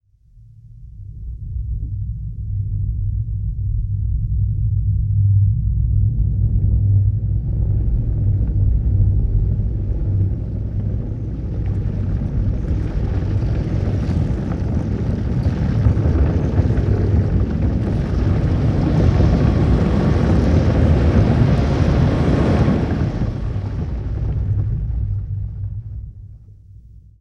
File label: Rumble Low Frequency Debris; Impacts & Destruction Sweeteners; Sony Pictures Sound Effects Series Volumes 1-10; Sony Creative Software